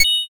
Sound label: judge perfect